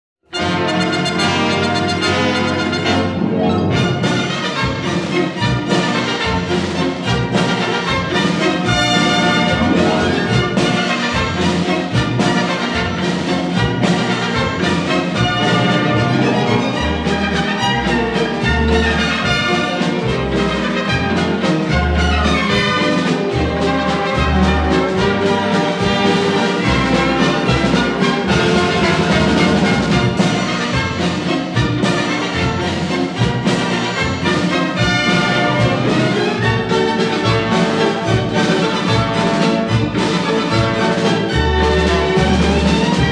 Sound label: Valse, Orchestre